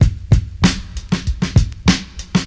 128 bpm drum groove g key, Free breakbeat sample - kick tuned to the G note. Loudest frequency: 949Hz; drum loop